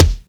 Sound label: Kick